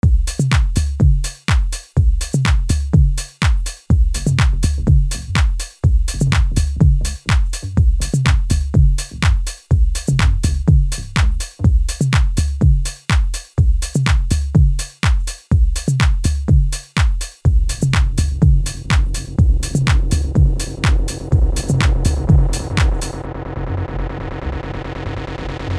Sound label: Tech house, minimal